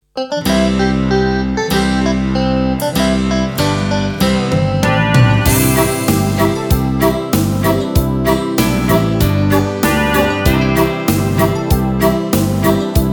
Oh Susanna To play along with, recorder

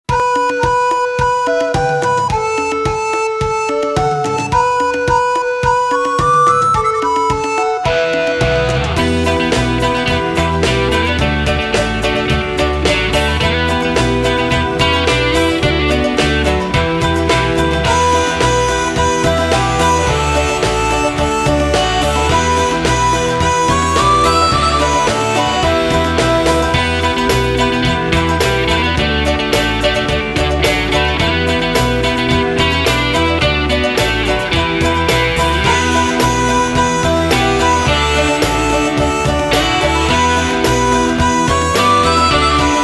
ukulele

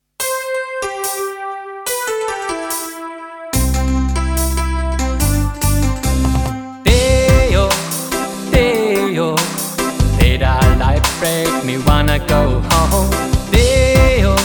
to play along

